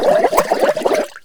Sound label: Bubble Shot; Princess & Conquest v0.20.06